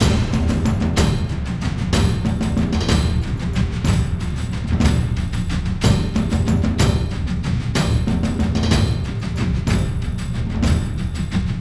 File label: pirates incoming loop, simple epic hard drum loop